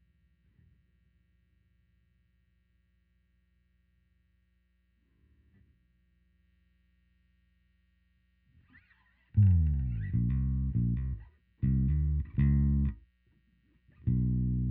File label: new Bass